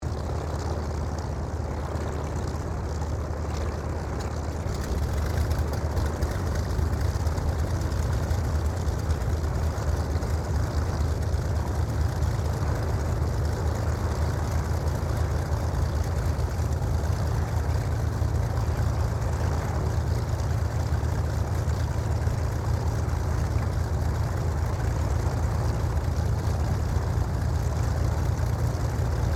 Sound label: AIRCRAFT AIRFIELD PROPELLAR AIRCRAFT IDLE TAXI; Airplanes (Sound Effects:Vehicles)